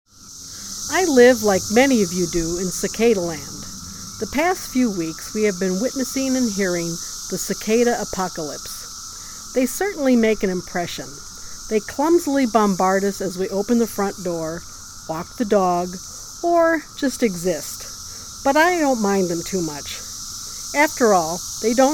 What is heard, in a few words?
cicadas